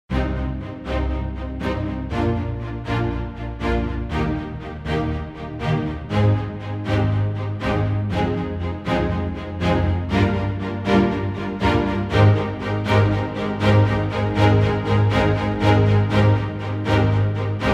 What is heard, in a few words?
Orchestra